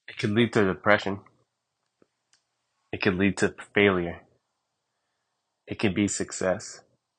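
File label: fail & success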